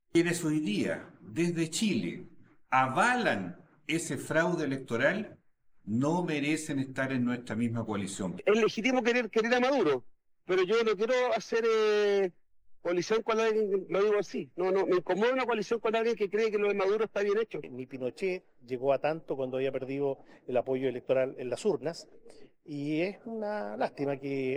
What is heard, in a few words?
vocals